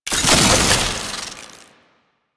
Alchemy Grenade Pickup Electricity; Warframe; Entrati

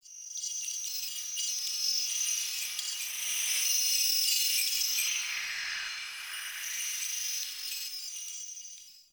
Data Texture; Warframe; Venus Open World; Venus Solaris United

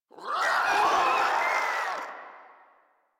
Infested Crawler Death; Warframe

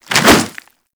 Swing C; Warframe; Infested; Puss Ancient; Attacks

